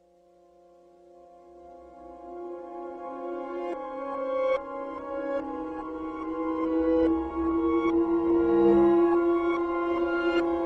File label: Creepy Music Box Reversed